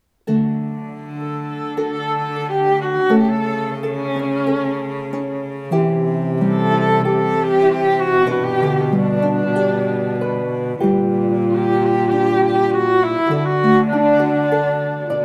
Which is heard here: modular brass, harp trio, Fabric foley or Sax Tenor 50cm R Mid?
harp trio